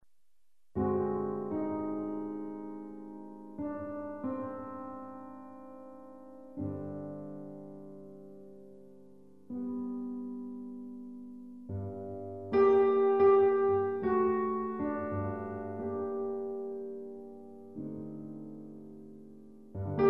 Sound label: pure piano opening